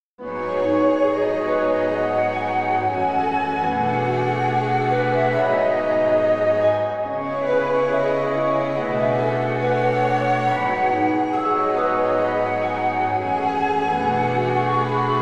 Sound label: Concertino Flute Harp